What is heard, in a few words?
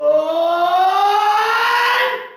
rising wail; killer7